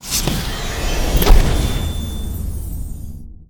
Reward

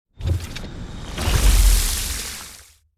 Toxic Substances; starbites

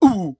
Maggot Bounce; gamerip

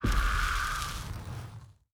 Laser Death; Ion Shift

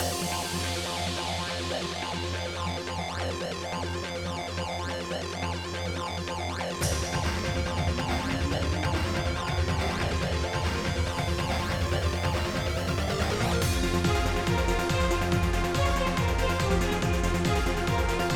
Event Suspense Heavy

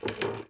wood step

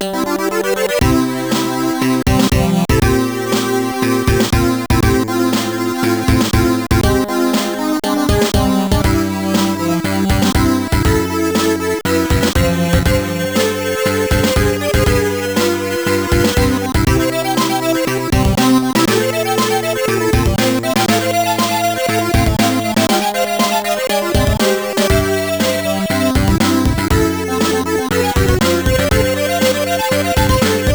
Ending; Teenage Mutant Ninja Turtles; Amiga

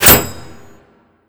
Hit; Sarco

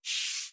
Rattle Snake

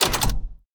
Item Machine Scroll; Josh Journey Darkness Totems; Santuario

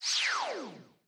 Menu SFX Scene Transition Out

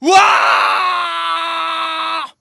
Warcry; Knight Online

